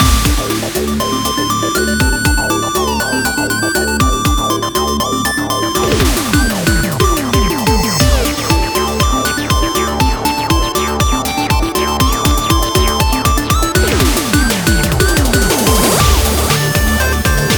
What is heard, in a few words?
buildup; Rhythm Quest